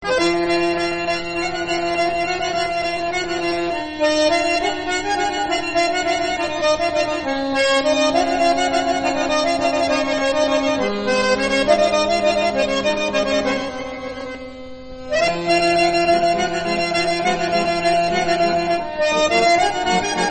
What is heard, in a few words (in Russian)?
Adygejskaya Cherkeskaya narodnaya melodiya